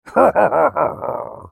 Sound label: laugh; Wolfman; Character Voices - English; en US